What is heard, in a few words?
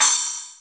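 R8 CRASH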